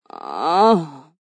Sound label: Moan Sexy, Female moan, pleasure, sexy, (human voice); Groaning Sound Effects; Groaning (Sound Effects:Voice); VoiceBox; Twistedtracks